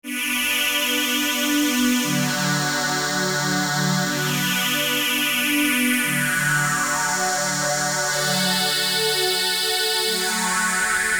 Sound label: Bm 120 Korg chords